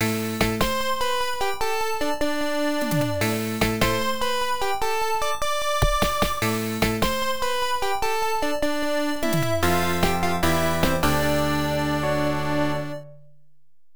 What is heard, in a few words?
Main Theme Fanfare; Jinn Eien no Yuushi; pc 9801